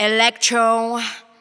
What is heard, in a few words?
Electro